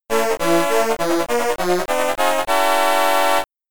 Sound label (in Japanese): グランプリ・ゴール・ミュージック